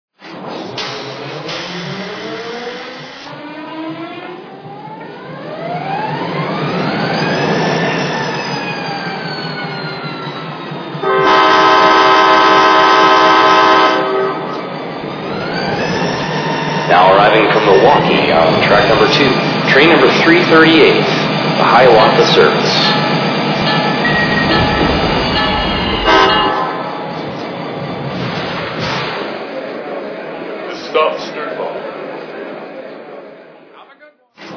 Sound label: F40PH; AMT